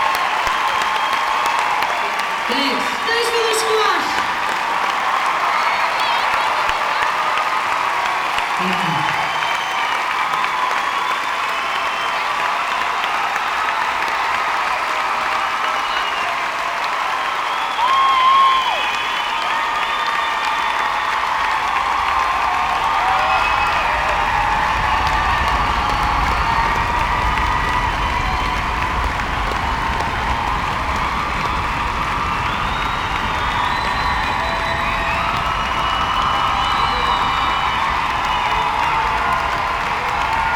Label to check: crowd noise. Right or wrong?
Right